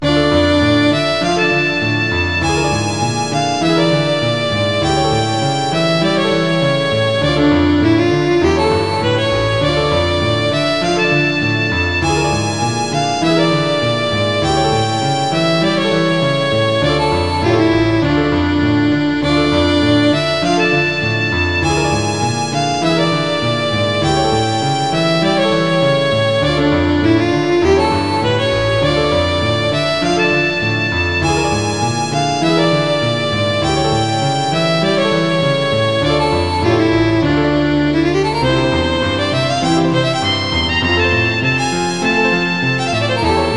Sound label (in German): violine u klavier klavier